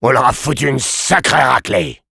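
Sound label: cheers, French; Sniper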